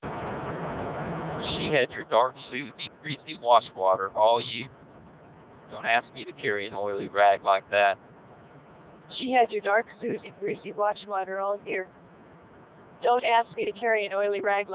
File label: car, noise